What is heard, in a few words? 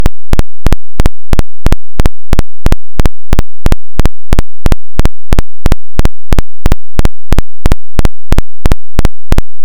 whoosh, Mars; mars2020 supercam; sol 0123; data calibrated audio